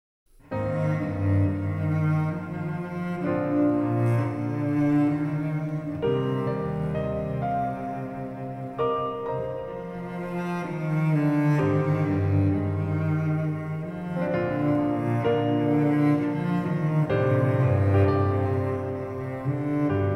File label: cello & piano ver.